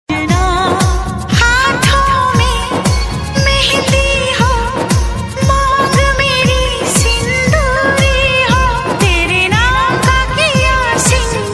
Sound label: Wedding Anniversary Song